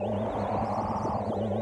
Ufo Fly; cut the rope time travel; android

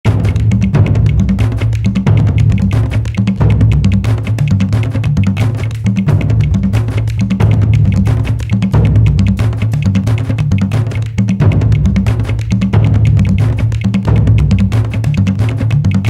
Tribal; Crash of the Titans; PS2, PSP, Wii, Xbox 360; Goar Titan

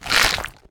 Crock Carnivor

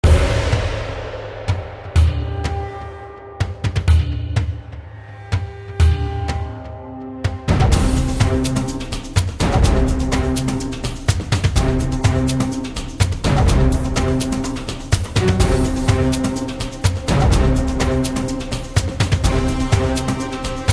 Dead March Fight

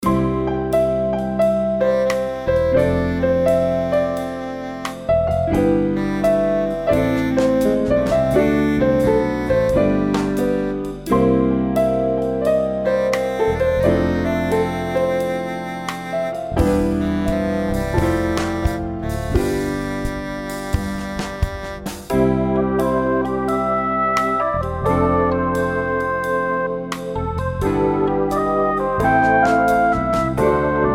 Easy Jazz; percys predicament deluxe; 3ds switch

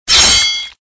Slice

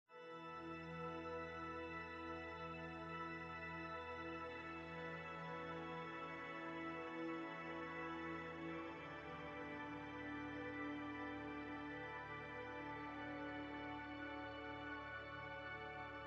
Desert Music, Music Section 70bpm Organ

